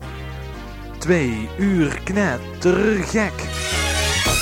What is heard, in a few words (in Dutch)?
Jingle kort; Knettergek